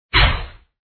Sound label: black widow attack contact middle